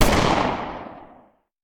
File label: Hand Gun; Sound Ideas; Guns